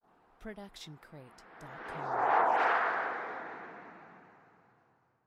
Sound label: jet through clouds; soundscrate